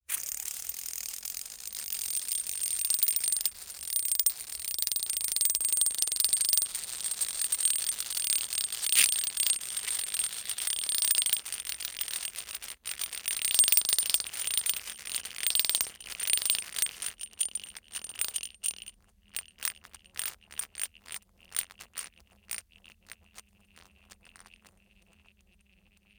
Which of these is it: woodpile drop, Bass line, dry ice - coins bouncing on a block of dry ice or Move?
dry ice - coins bouncing on a block of dry ice